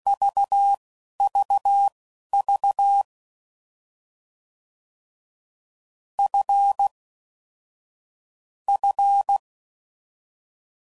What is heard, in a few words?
F Prefixes, CW Code Groups 10WPM; Morse Code; FLs & THs